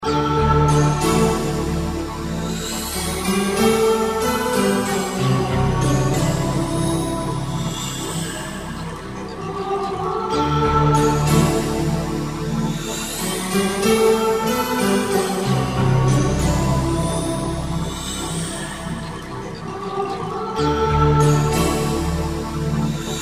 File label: roland d-50